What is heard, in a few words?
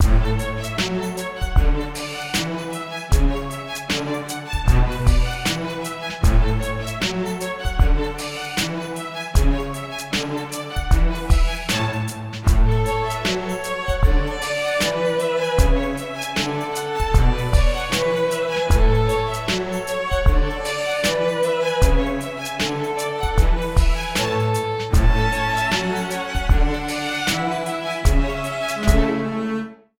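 strings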